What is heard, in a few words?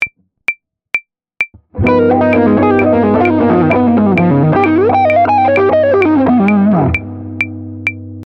Lick